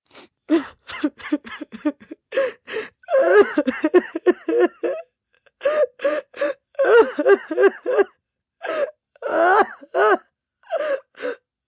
Cry Sobbing, Cry, female, sobbing, distraught, pain, hurt, sad (human voice); Crying Sound Effects